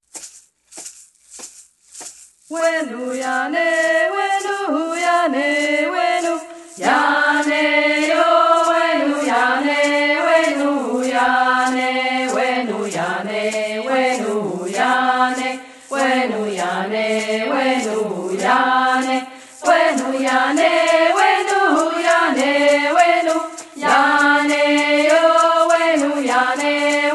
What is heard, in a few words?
Fish dance song; LES MESSAGERS